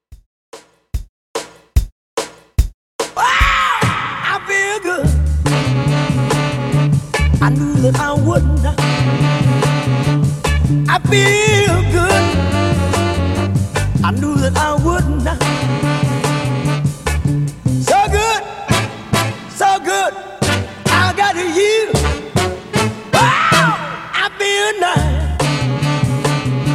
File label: 146 Bpm; Dj Intro Outro Clean